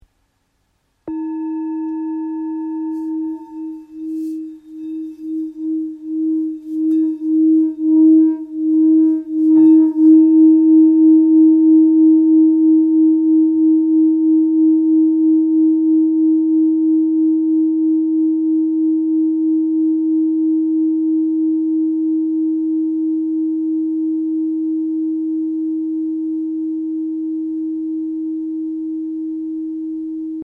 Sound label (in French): Bol Thick re diese